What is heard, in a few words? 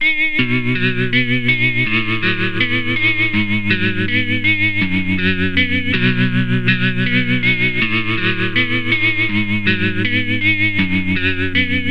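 motif guitar devtronix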